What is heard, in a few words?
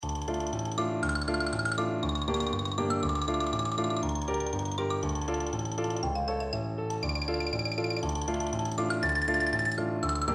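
xylophone, percussion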